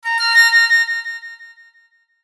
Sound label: CHIME ARPEGGIO DELAY; Multimedia; Chimes; Blastwave